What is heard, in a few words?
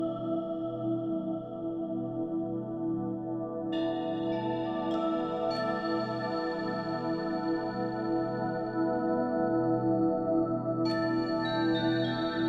Baby Walk Loop; Toren